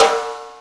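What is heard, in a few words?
snare drum hit, roots reggae; Sounds Good - Roots Reggae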